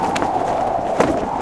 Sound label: SUPER SHEEP WHOOSH